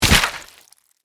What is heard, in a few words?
Impact Body Fall, Horror, Impact, Body, Fall, Splat; Blood and Guts